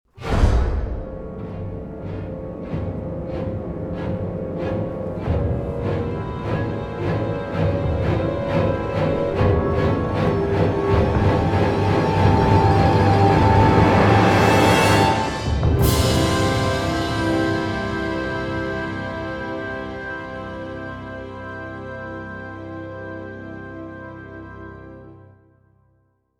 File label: cinema music